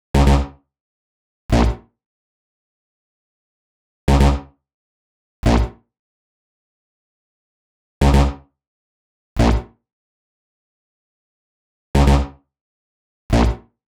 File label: 122 B#m Bass, Complex Electro Synths; Prime Loops; Specters